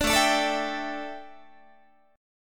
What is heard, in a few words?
A 7th Suspended 4th, A, down, Guitar; A7sus4 strummed